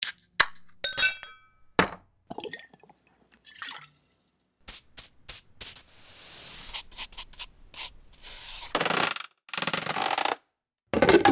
Kitchen Foley; Big Room Sound

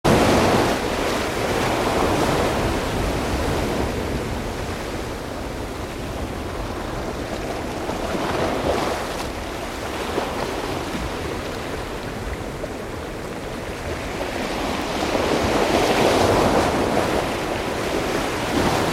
Water Earth; Field Foley; Water Earth FX

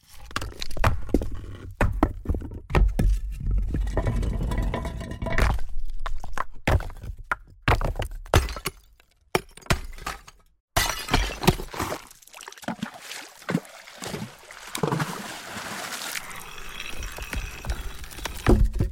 Rocks Momentum

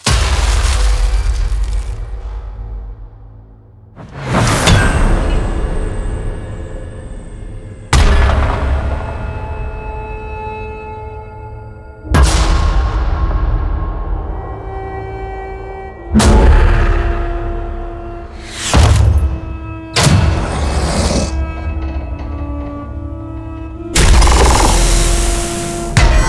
Trailer Hits; Cinetools

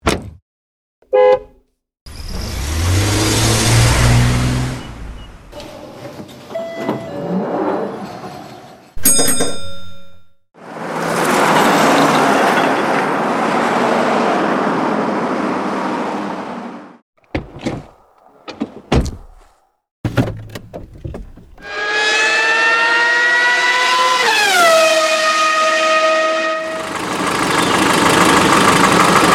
Modern Transportation; Field And Foley

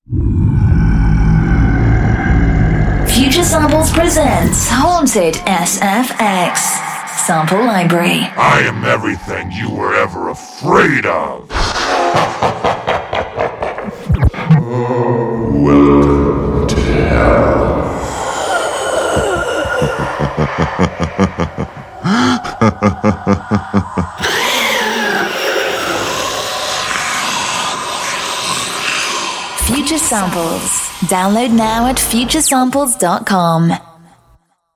Haunted SFX; Future Samples

